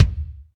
DM5 Kick, Alesis DM5 drum machine

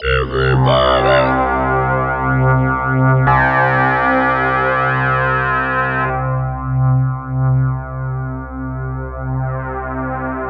SynthLine C2; 90 s Sample CDs; NiceBeats; SynthLine VOL-13

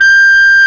CLAR, Clar Jazz

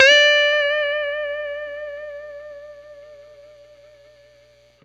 BLUBENDUP AG, Guitar & Bass; 90 s Sample CDs; Quantum Leap - Guitar & Bass

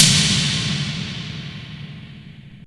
FT SD, CD DRUMS; Crypton - DrumBase5000; CD DRUMS #1

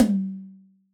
Yamaha DJX 2B, Room Tom

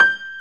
PNO Grand Pno; Club-50 - Foundations Roland

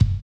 HI RIDER; Spectrasonics; Metamorphosis

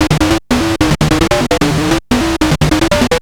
Atonaloop, Industrial Analog

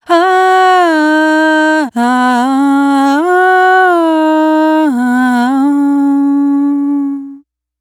Kirtan Ahhhs, East Indian Vocals; Sonic Foundry (Sony Creative Software); Continental Drift Aftershocks

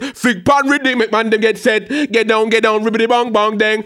HAL RAGGAM, RAGGA-MUFF A maj

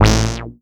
Filtered B, Basses; Drum Bass; Zero-G; Total Drum Bass

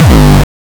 909 Dist Reso Crushed - G1, Kicks; Drums; Mbase 11 Kicks

